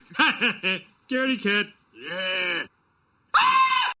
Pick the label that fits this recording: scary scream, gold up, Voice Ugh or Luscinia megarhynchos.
scary scream